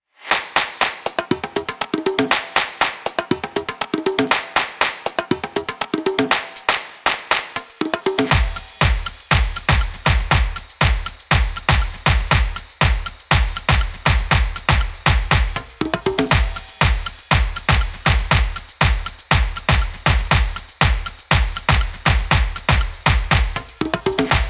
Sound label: techno beat stomp and clap